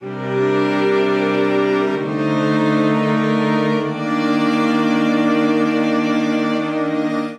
Progression The Old Man G Minor 130 bpm, String Quartet; MIDI Clips; Ableton; Factory Packs; Spitfire Audio